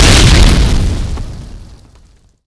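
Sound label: grenade explode; Explosions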